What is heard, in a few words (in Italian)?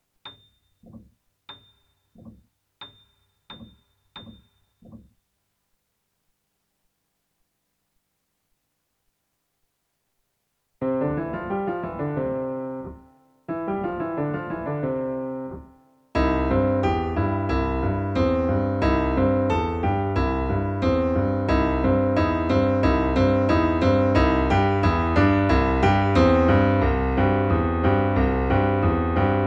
piano base lenta